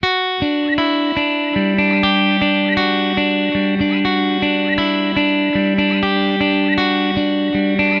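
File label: Guitar Alternative E minor 120bpm; Guitar and Bass; Ableton; Factory Packs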